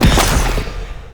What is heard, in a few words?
Ground Spike Burst; Enemies; Abilities; Warframe; Zariman; Void Angel